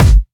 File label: studio kick drum g sharp key, Royality free kick tuned to the G# note. Loudest frequency: 429Hz